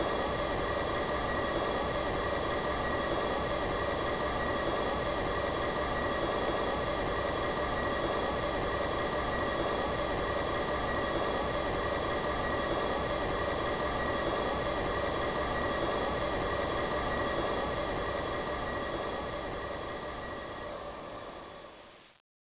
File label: overload; sven; custom weapons; cso; m134hero